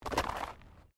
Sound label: Body movement Impact Roll Gravel; Body movement foley to simulate falls and deaths; Rolling; Objects